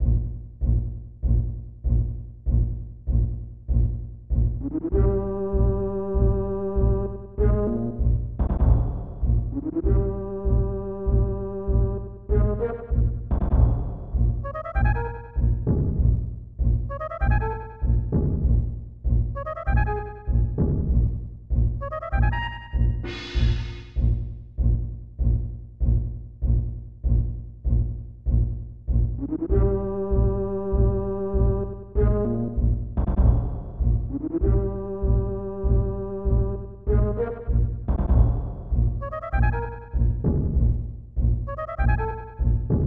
Dungeon; record of lodoss war 1995 snes

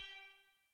Band Synth END; barbie dreamhouse adventures

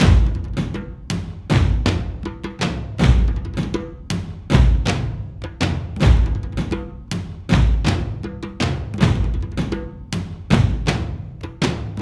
Drums; For Honor

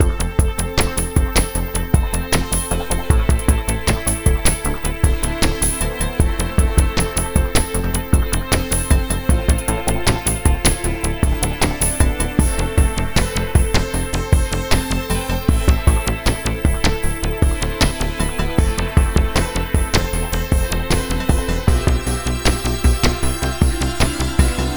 music

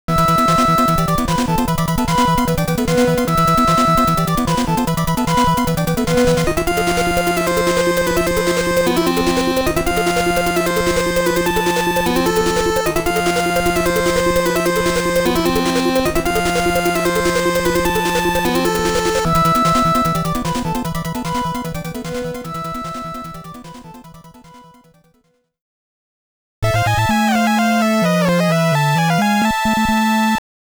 Boss ~ Clear BGM

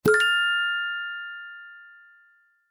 Sparkle Bonus; songpop party

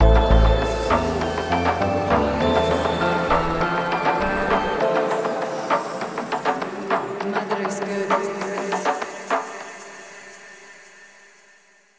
Acolyte Level Up; dying light the following gamerip 2016

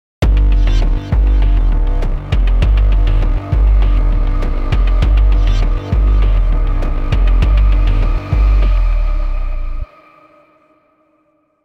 Police Station Cutscene Indoor Parking

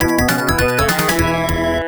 Intermission (Arcade Bonus Fail); bonanza brothers; genesis

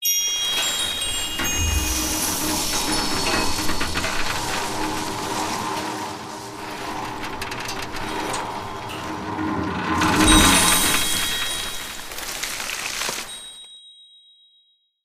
Village Vine Rope Sluice

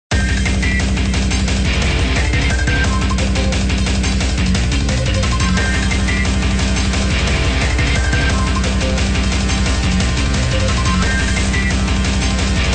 Bossbattle; gear venus edia android game music

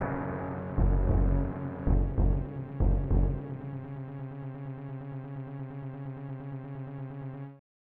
Plane Crashed; Turn and Burn: No-Fly Zone; SNES

